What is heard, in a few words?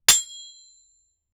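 steel hammer striking, forge; martillo de acero golpean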